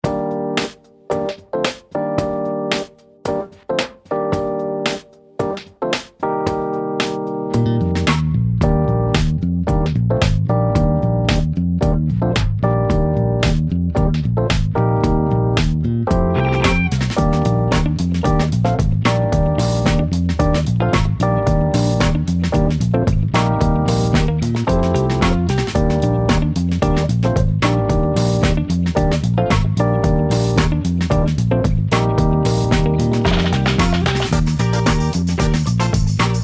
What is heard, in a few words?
jazz; Jazz (Styles: Contemporary/Smooth/Traditional)